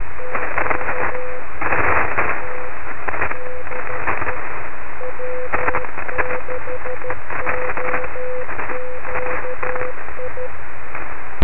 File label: 80m note